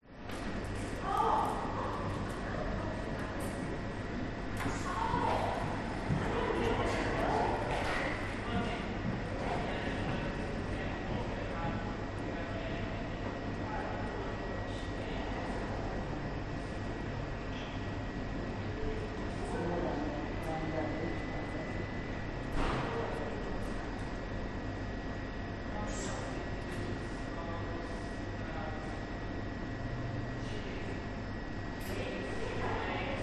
public places food hall large reverberant quiet few voices cafe close internal; australia